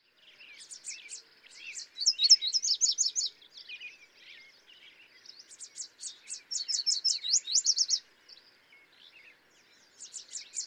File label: Yellow Warbler